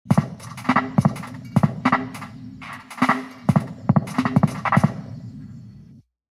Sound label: drums